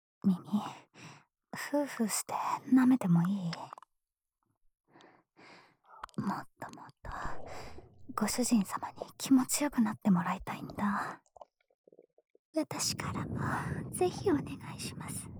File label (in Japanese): 耳舐め されながら キスし、3Pで中だしHする音声; 白黒エルフメイド